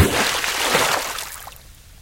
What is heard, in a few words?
player swimming change; castle game engine; fps game